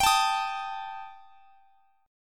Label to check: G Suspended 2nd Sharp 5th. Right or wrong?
Right